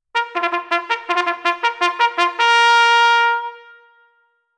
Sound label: Mount, cycling bugle, Bugle Calls; c1880 Cycling Bugle